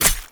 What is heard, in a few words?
claw